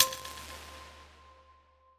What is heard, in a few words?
On click start game